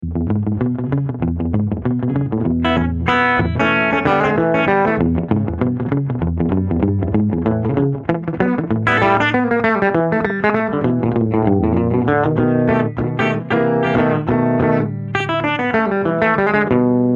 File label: Slapback